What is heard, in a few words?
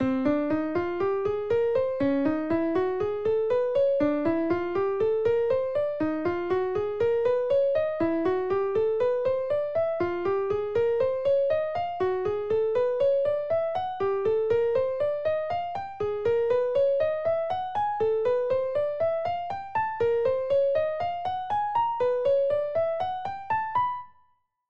minor scales